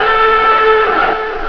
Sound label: siren; firearms; storm